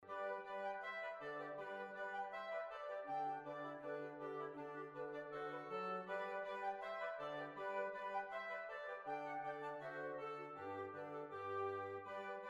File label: Holywells Hornpipe